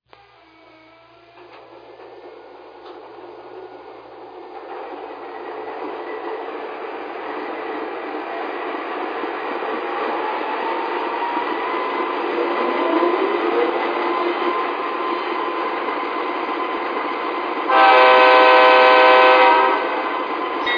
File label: es44ac; es44ac all